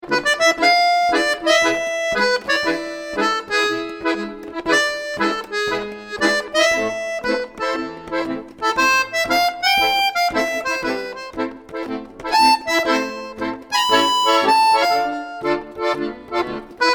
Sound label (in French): ma premiere guitare